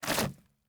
Liquid Water Towel Wet Towel Dropping Into Plastic Tub